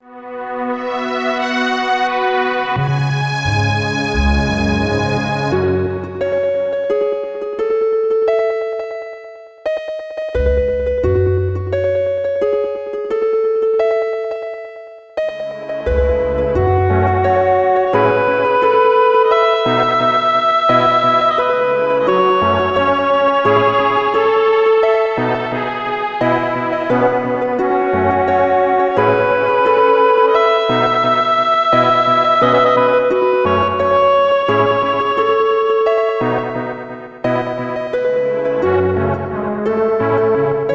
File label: Bgm Gameover; Fragrant Story; 3DS